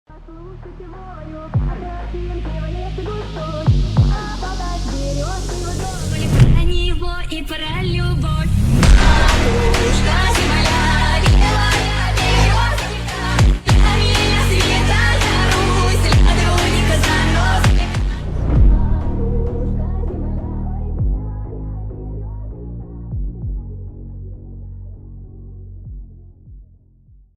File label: funk ultrafunk slowed